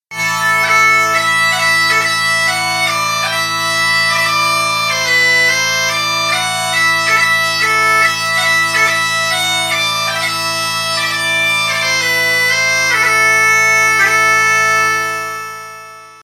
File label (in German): Gr Marktpfeyf, Dudelsack